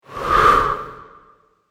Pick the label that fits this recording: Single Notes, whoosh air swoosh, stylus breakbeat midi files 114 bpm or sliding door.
whoosh air swoosh